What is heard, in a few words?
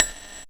Gated Kit, Percussion